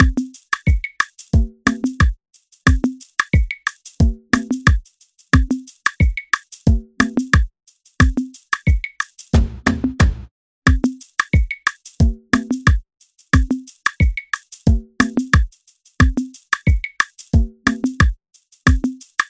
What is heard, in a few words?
90 bpm afrobeat drumloop; spro